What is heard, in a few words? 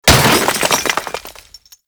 Assassinate Barricade Destruction Small; Warframe; Nineteeen Ninety Nine